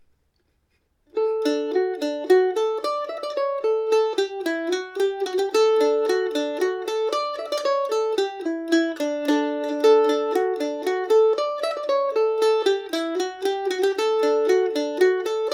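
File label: jer o connells polka D major, mandolin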